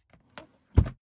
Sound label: suitcase close case quick